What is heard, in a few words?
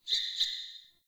Sparrow Death State Double Chirp; Animals